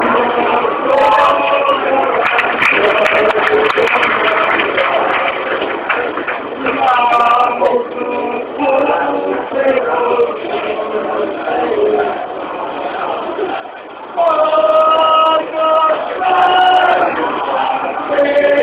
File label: silent night, fan chants